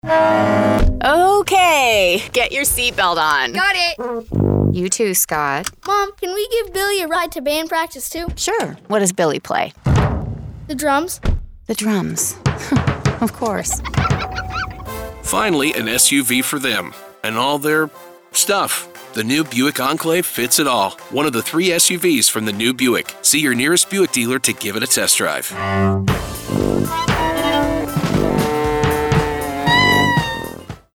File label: drums, radio spots; buick enclave